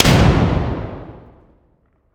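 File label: weapon sniper fire player atmo int; gmod; viper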